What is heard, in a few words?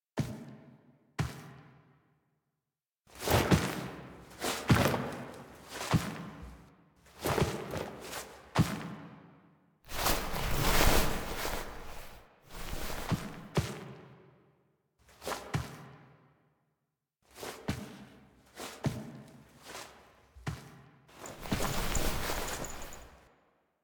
Foley B; Drifter Lotus Operator Awakes